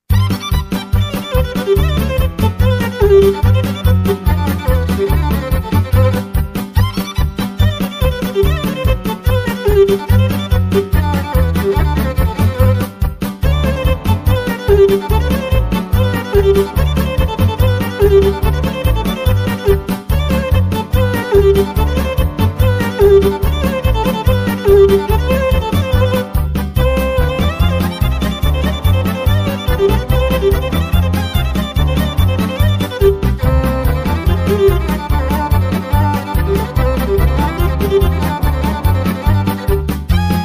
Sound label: plg150vl strings